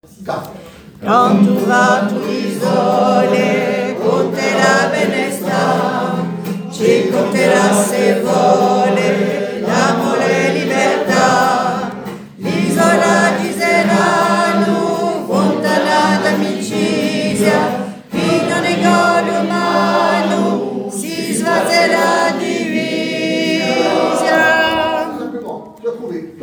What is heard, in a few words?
TIERCES Mille cori couplet